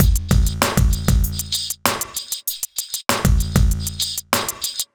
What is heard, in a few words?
E Coast HipHop Drums